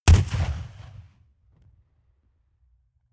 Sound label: rifle ak-47 exterior fire single bass; comedy-cartoon; Pistols and guns; pistolen-gewehre